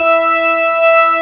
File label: B3 E 4, organ; AKAI S-Series CD-ROM Sound Library VOL-1